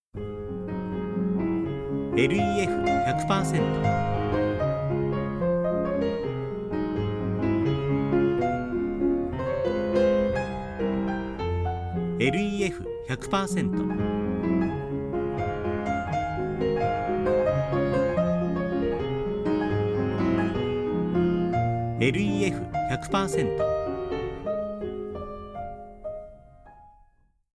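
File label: piano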